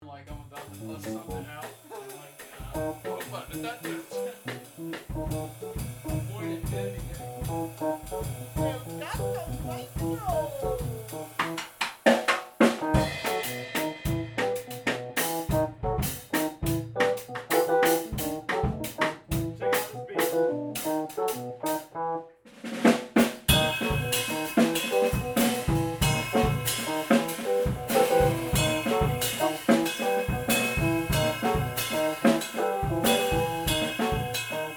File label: Gato Maestro w drums